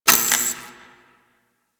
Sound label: Derelict Light Flicker; Ambience; Props; Warframe; Orokin Derelict